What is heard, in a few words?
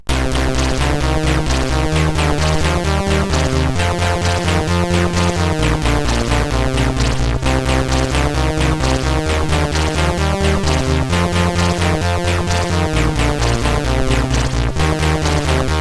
Dingdong, CSG8580R5